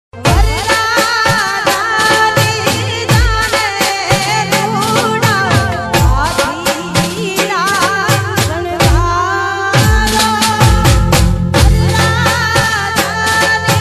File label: gujarati ringtone